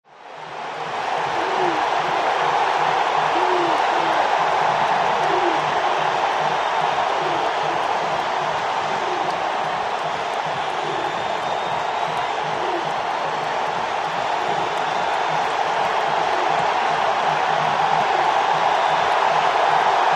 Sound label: Sports Crowd Big, large